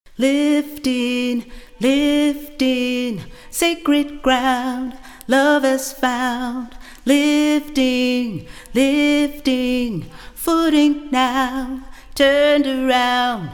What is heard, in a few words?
ALTO, choir; The Freedom Choir